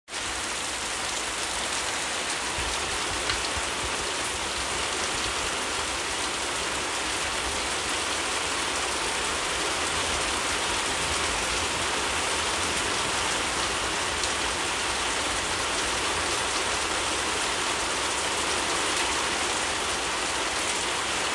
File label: real rain sound